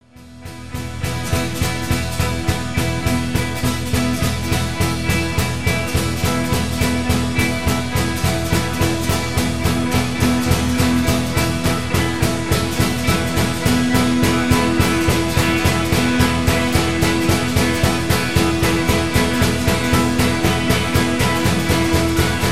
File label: instrumental live